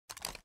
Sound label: uzi put away; Tacz1.1.5+; RSWS 1903